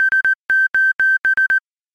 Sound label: morse wave